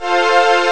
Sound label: string pad maj; Amiga Soundtracker Sample Packs